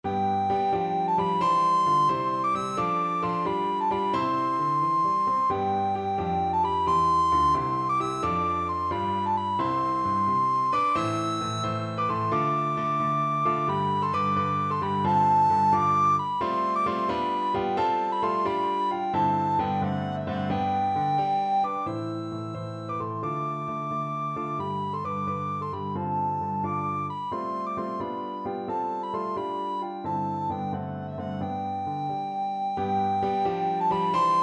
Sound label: recorder